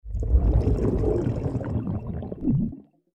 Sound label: bubbling mid freq